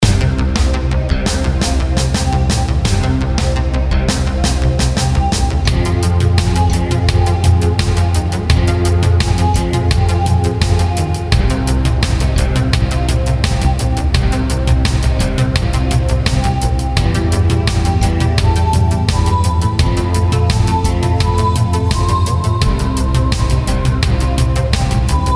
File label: Movie instrum